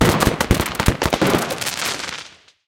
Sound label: Activated Firestorm No VO; BTD6; Gwen Firecracker